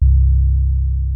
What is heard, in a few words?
JUNO106 BASS; Rubber Chicken Software Sample Bank